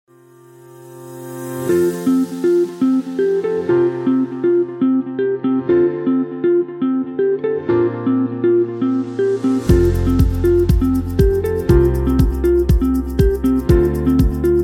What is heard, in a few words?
corporate background; no copyright music